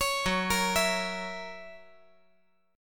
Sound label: F sharp 7th